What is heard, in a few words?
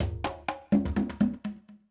PERCUSSN ETHNO 125, Drum